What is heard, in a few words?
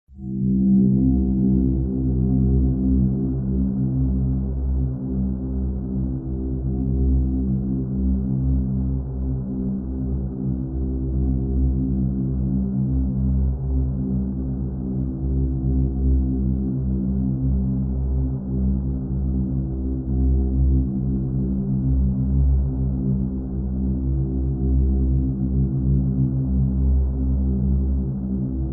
Amb Sound Scape Deep Bass, Wind; Ambient SoundScapes